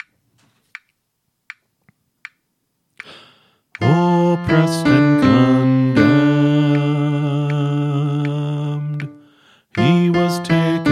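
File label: Song, Choir Practice Tracks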